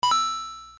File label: coin; mario